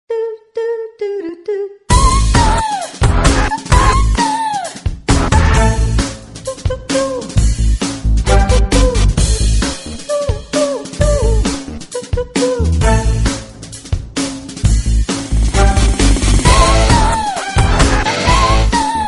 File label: Samsung Galaxy M34 5G Stock Ringtone